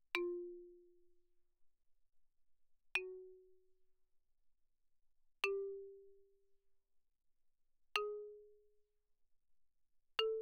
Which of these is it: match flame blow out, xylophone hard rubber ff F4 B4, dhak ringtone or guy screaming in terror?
xylophone hard rubber ff F4 B4